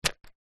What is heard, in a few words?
household electronic device power supply dc pull from unit; zapsplat